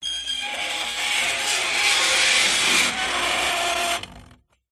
dragging a piece of metal across glass surface piercing shrill; Sound Ex Machina